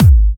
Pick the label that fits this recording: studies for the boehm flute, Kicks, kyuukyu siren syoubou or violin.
Kicks